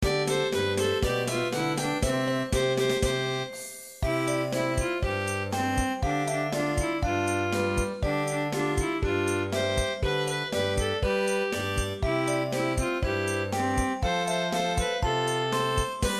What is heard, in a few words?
Moving dog song Music; 感動狗狗之歌; 一首關懷之歌、一首學習之歌、一首生命之歌